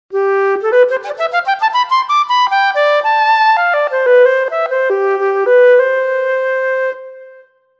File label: Real Flt; TURBO VL